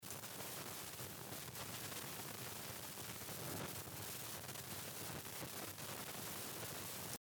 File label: noise